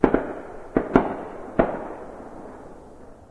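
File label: distant gun; ambient; Left 4 Dead 2; Source Engine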